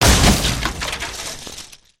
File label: racing wood smash; destructibles